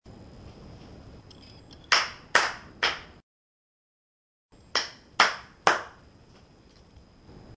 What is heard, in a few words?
claps